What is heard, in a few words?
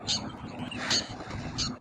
Long tailed Shrike Lanius schach bentet calls female; Amar